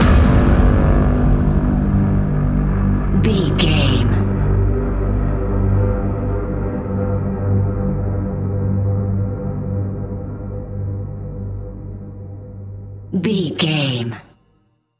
suspenseful mystery lurking

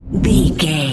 whoosh slow deep

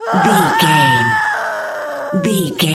voice female scream low

